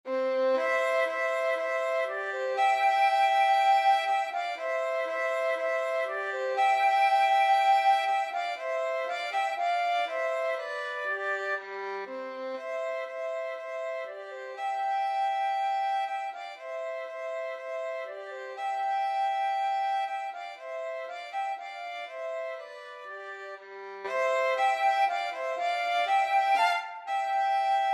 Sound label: violin flute duet; vivaldi spring